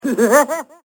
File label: laugh; krusty